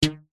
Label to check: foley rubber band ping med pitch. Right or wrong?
Right